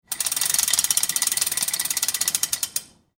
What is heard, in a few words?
Chain Through Pulley Slow Speed; Metal Sound Effects; LYN103 package of Chain Sounds; Lynne Music